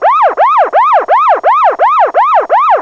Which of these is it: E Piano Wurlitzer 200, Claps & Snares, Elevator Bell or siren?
siren